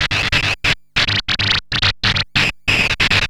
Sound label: Treat Guit, Industrial Nasty